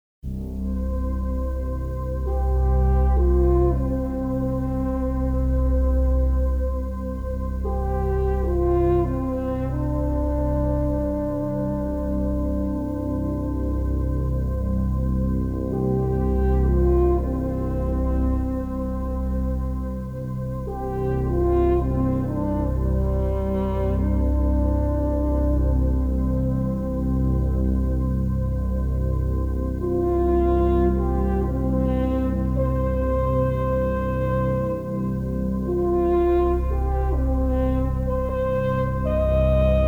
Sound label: mountain horn